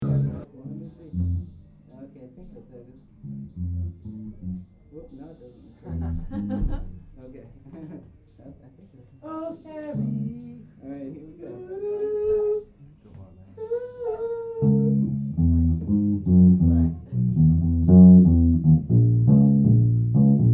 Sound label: Bass song